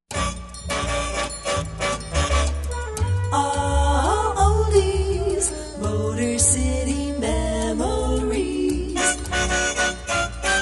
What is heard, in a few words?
Three Part Harmony; WHND